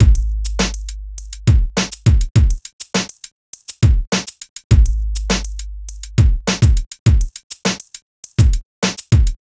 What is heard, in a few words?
Drums, 102 Fmin; Big Fish Audio